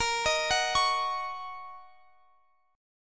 Gb 6th Bb

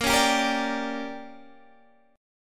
D Major 7th Suspended 4th Sharp 5th

